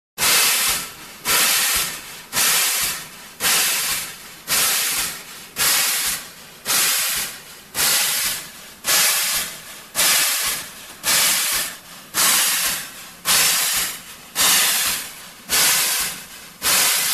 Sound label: Train Steam Chuffs Steam Train Chuffing; Hollywood Edge 3-D SFx